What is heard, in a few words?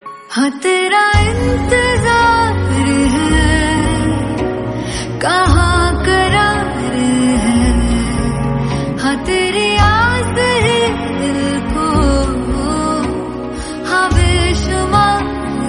Acoustic Version